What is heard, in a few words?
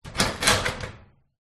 industrial metal cabinet bolt unlock open; zapsplat